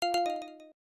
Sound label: Beep; lowbattery